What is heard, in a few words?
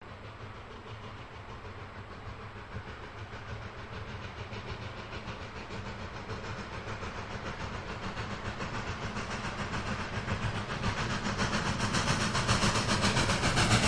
Steam Loco By Right, steam train